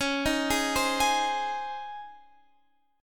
C sharp Major 7th Suspended 2nd C sharp; arpeggiated

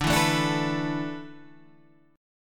A Suspended 2nd Suspended 4th D

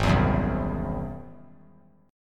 G sharp Augmented 7th G sharp, down; strummed; 3C,3F,3A,3D; JGuitar

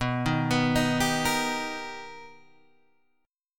B Augmented Major 7th B Guitar Open G, chord

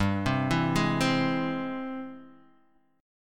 Ab Augmented Major 7th G, guitar

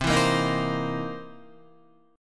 C 7th Sharp 9th C Guitar; C7#9 strummed; JGuitar

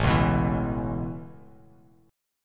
A Major Add 9th A Bass Standard, chord

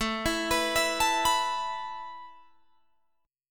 A Suspended 2nd A Guitar Open G

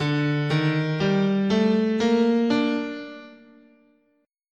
D Japanese, guitar, scale

